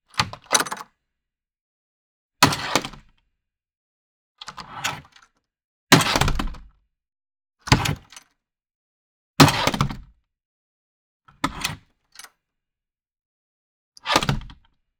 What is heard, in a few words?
door industrial open close office